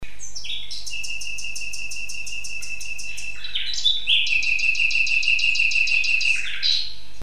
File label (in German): Melodik einer Nachtigall; Mikrobuch